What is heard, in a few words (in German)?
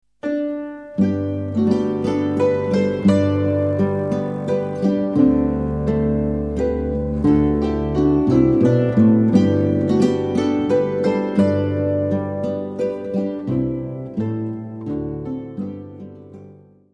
kohlbauern menuett